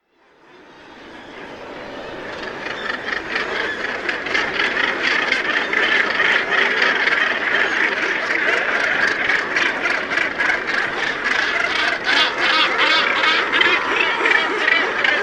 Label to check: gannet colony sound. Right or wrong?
Right